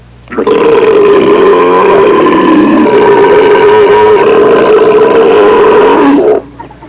booger burp

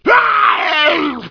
zombie death; zombierpg

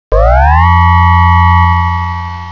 robot; breakfree3

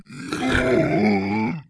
zombie voice idle

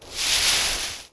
grass noise; cstrike

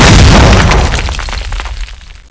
gen explode; tfc

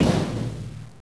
pl duct, player

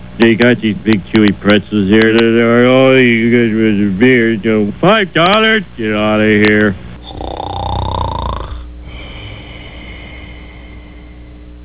drunk; breakout; dod

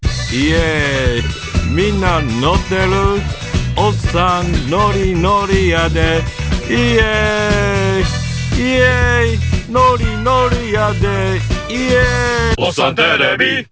bgm clear; svencoop; uboa45

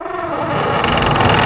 open; svencoop; underrain

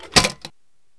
m249 cover down, weapons

